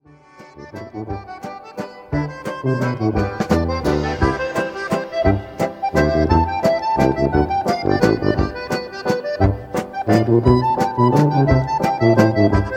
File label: Laendler